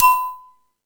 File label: Percussion